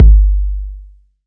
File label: fluffy kickdrum sample g key